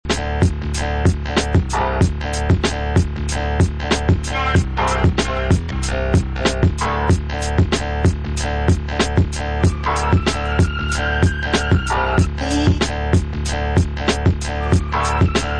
cold steel (instrumental)

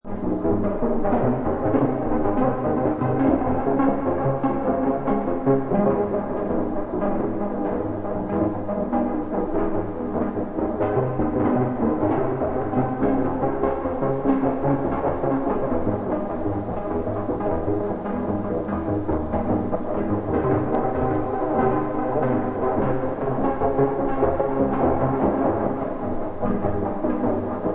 electronic rhythm

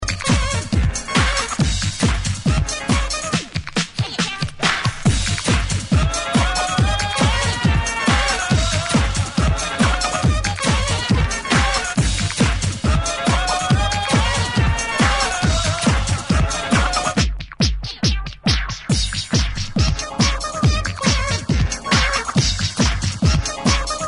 p-funk; Obscure party ghetto/booty tracks; Funk imprint

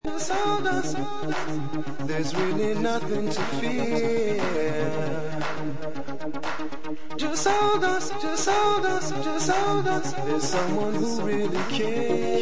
(instrumental); disco

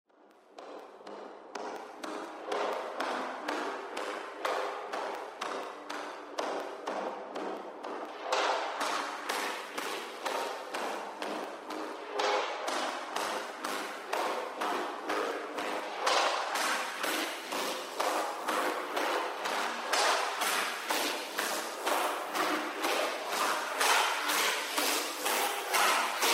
ghost scream

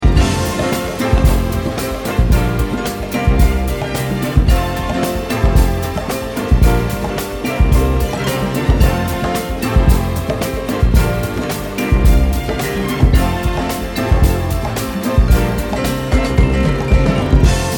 Piano Intro

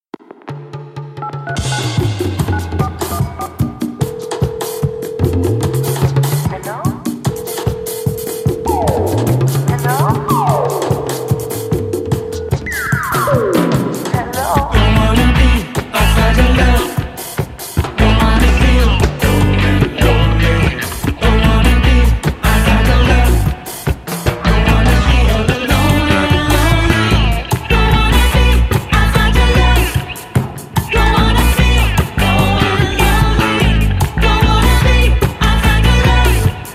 African Reggae Disco

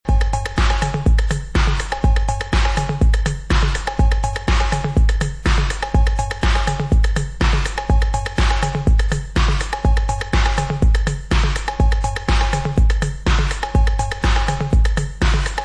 Instrumental

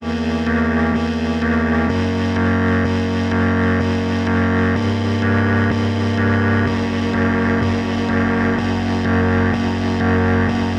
Arp; Central Processing Unit

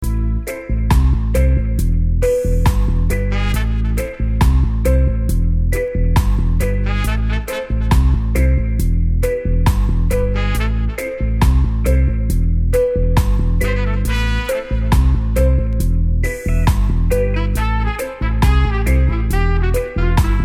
instrumental fullhorns

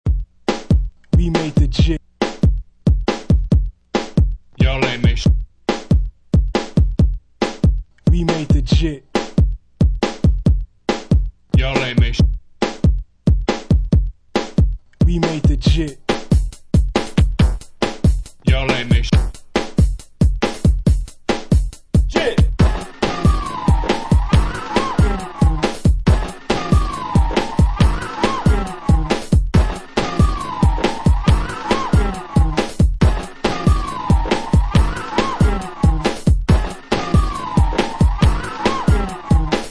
Instrumental

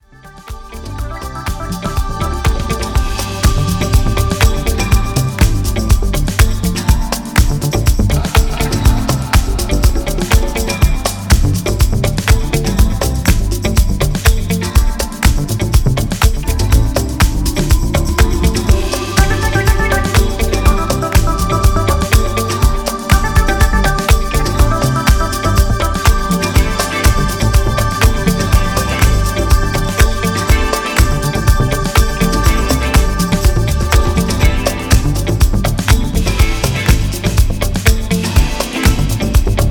jazz-funk

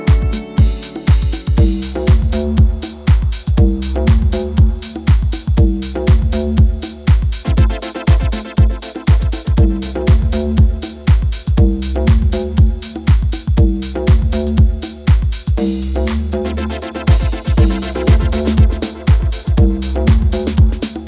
Ocean Groove Piano Mix

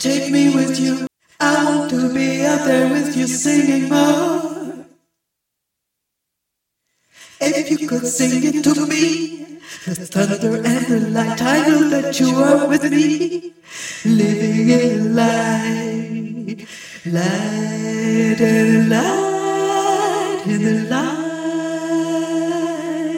 (acappella)

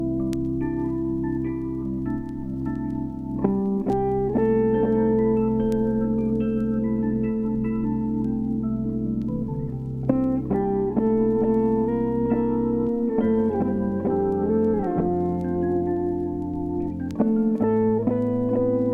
Easy Guitar

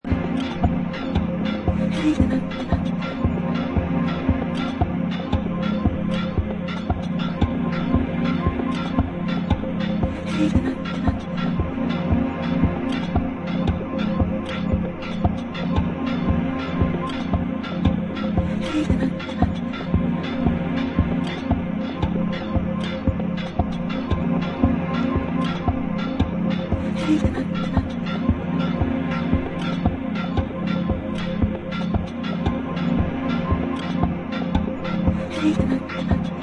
blue organ

